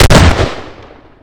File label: shotgun dbl fire